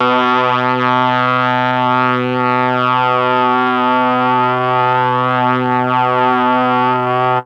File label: MEL BRASS; ILIO; Propeller Island; Legendary M400